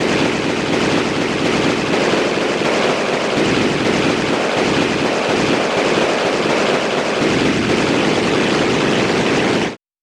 applause bass

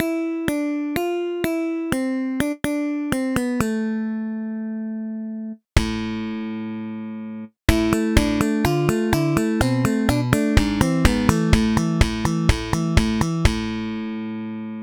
slap bass

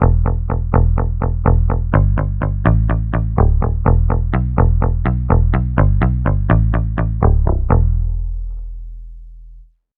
palm muted guitar bass

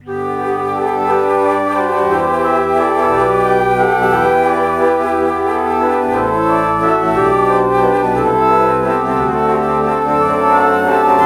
alto flute alto flute morph

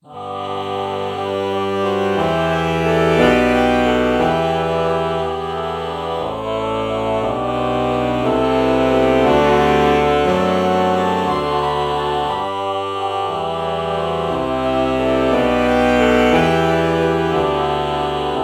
alto saxophone ah morph

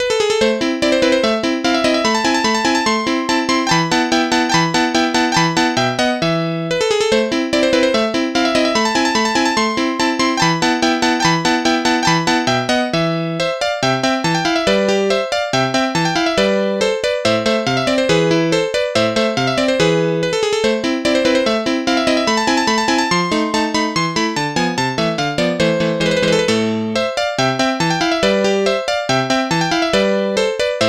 electric piano; SpectMorph Evaluation #4

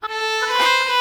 oboe violin melody morph

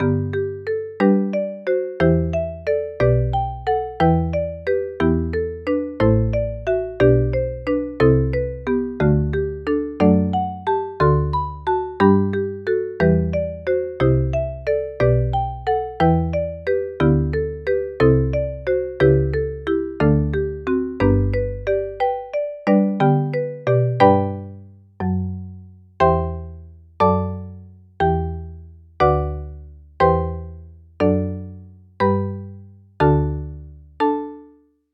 marimba

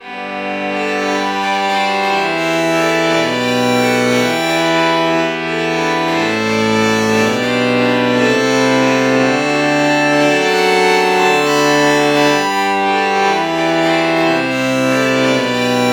violin violin morph

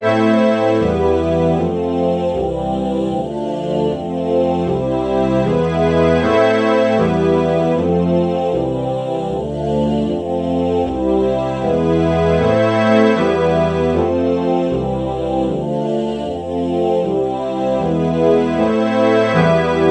reed organ oh true chord morph

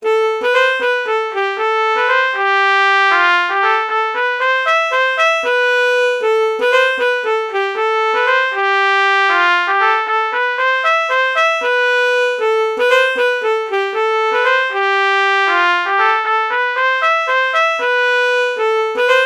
alto saxophone trumpet melody morph

